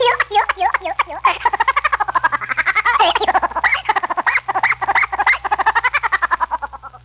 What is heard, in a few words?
laugh chipmunk; ooh ahh